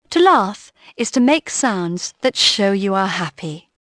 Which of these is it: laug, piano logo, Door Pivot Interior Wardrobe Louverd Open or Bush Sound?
laug